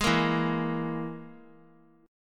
E-Major Flat 5th E; strummed; JGuitar

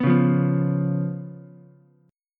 B Suspended 2nd Flat 5th B down Ukulele; strummed; JGuitar

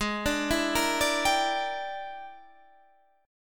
E 7th Sharp 9th Flat 5th Ab, chord

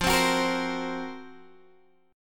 Gb Major 7th Flat 5th

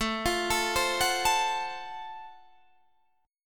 Gb Minor Major 7th Flat 5th, chord

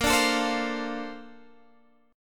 A sharp Major 9th, Guitar, DADGAD

